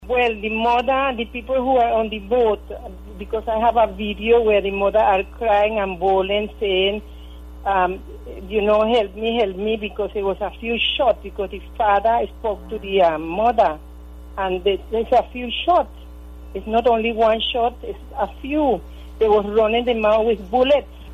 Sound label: multiple shots